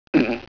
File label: monk huh uh